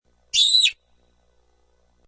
Little Girl Shriek, Single high-pitched girl's scream; Screaming